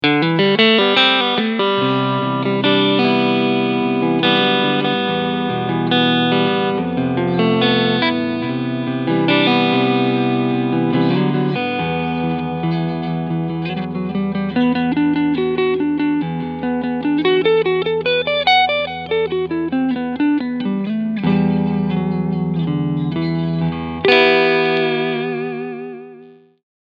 Front PU Clean

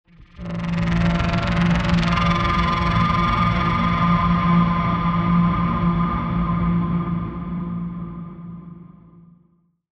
Sound Design Atonal Atmos; Cerberus

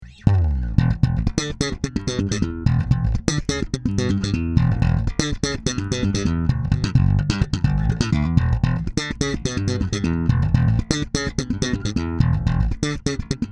70s N.Y.C. Slap

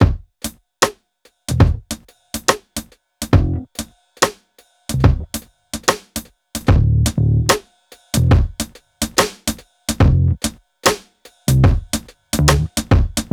Soul DnB Saturation

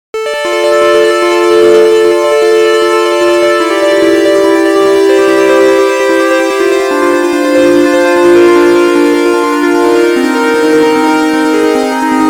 China Saw Pulse

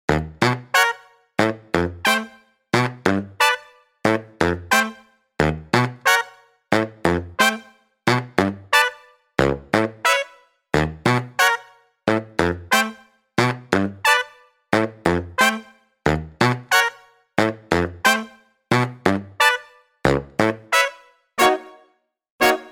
Horns; Session Horns Pro

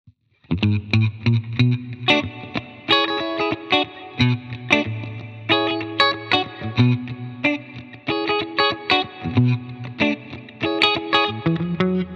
Danelectro Clean MIDDLE

